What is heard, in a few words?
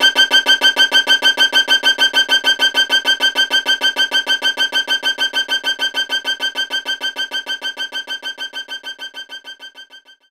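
Violin Strike G6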